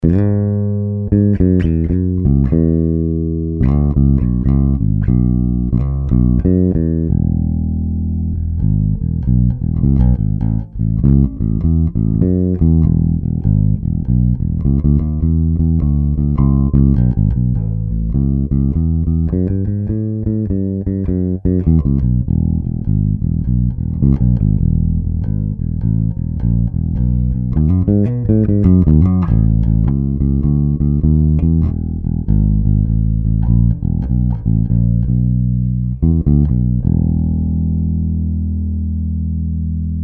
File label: CAR